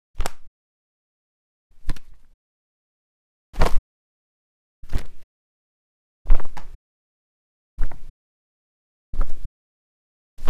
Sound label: Fabric; Soundmined